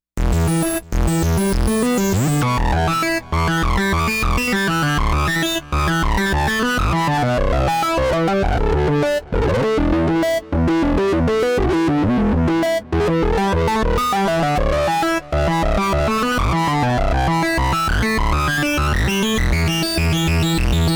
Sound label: hot sync; JUNO-Di Patch Demo